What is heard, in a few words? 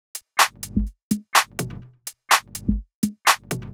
Drum Loop 125BPM; Unit Rcrds